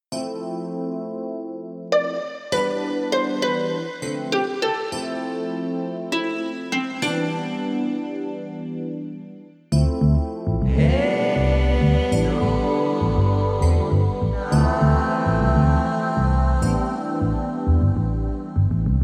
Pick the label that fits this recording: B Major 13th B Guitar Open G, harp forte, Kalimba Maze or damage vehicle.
Kalimba Maze